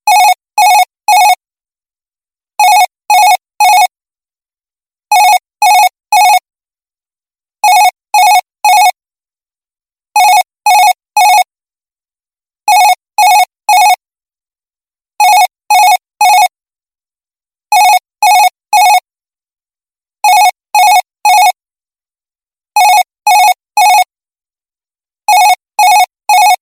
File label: Office Phone Ringtone; ringtones